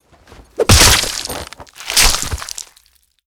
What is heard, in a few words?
npc kill 1hm dual wield